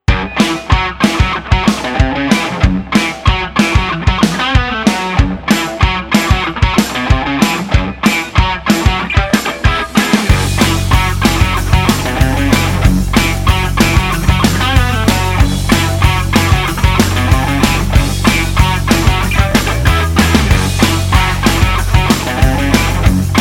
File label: Happy Rock; MIUI14 and HyperOS 1.0